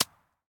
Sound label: Baseball Bounce Dirt